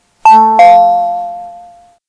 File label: dindon